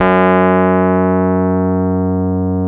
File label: Electric Pianos; Wizoo